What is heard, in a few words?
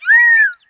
Gambels Quail